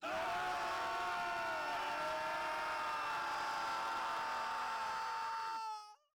everyone scream; scout

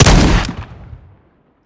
shotgun shoot

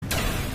fire

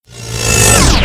strike incoming; earthmover

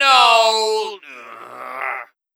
Tank Destroyed; Gray Mann; MvM

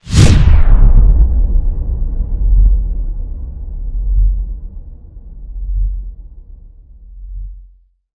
shockwave; executive command

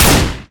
automatic assault fire

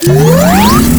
wind up; tokamak

